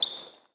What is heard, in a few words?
Sneaker Squeak Regular Court